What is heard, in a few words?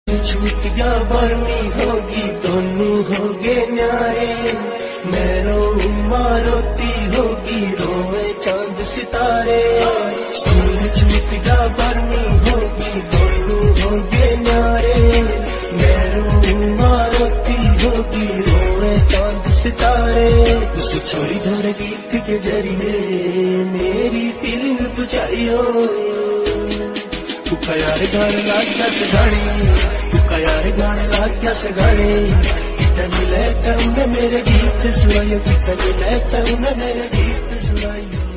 New Punjabi; Ringtone